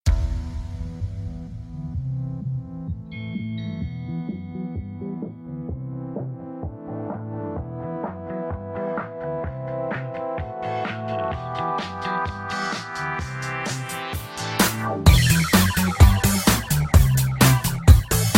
samsung galaxy ring

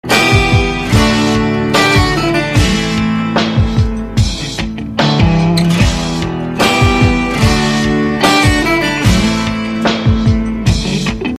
blackberry guitar message

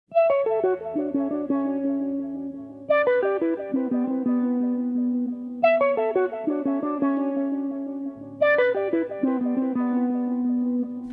champagne loop

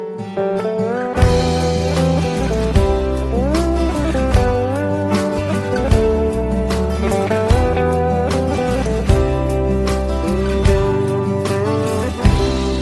turkish music

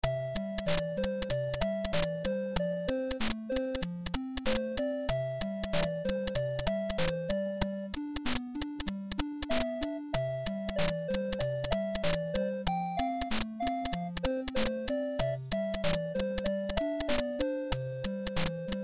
8 bit

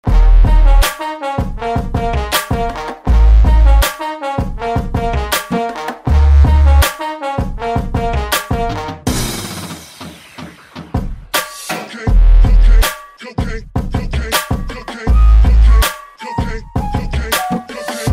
soul trumpet; ringtone

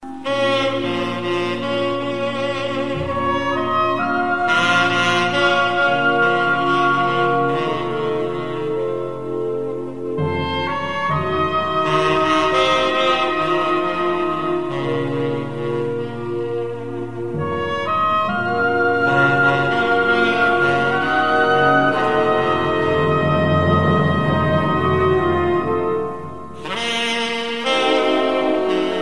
sad saxophone

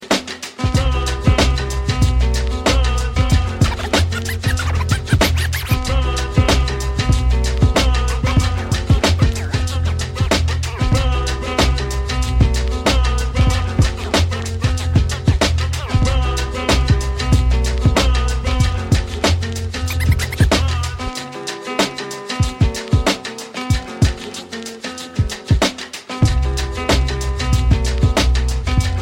running instrumental

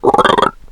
frog; Dota 2

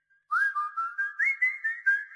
whistle

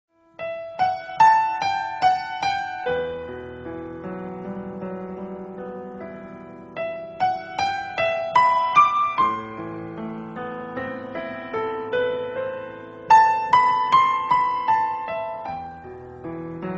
piano; saint seiya

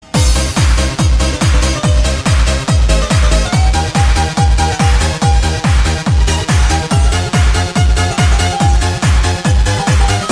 greek dance mix

